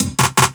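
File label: LOOP snare drum, Drum & Bass, 160 BPM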